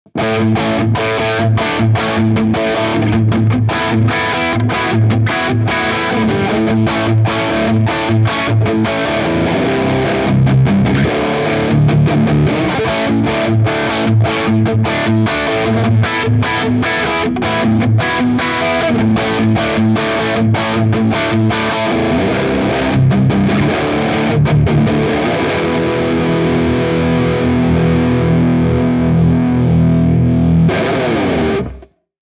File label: LTD Baritone Arctic Metal Crunch Humbucker